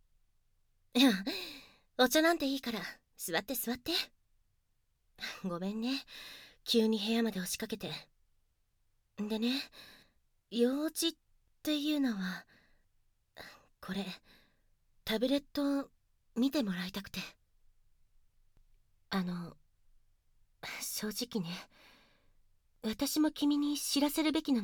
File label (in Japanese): お耳のキッスで頭の中トロトロになってきた?（キス＋耳舐め＋フェラチオ）